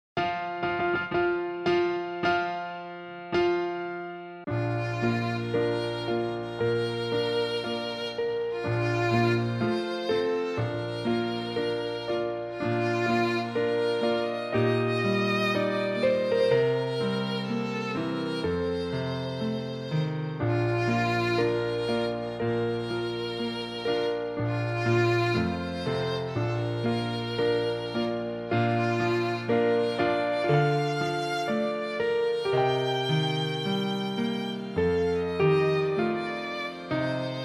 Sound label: Traditional Wedding Processional, Piano